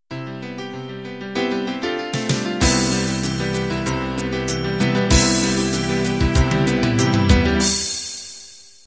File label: folk ballad intro